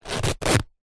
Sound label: bag rucksack fabric empty zipper; zapsplat